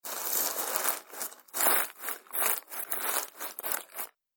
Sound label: Packaging Paper Noises